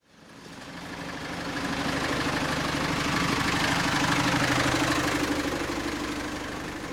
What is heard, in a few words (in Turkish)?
agir is makinesi ve yogun motor sesi; agir is makinesi ve yogun motor sesleri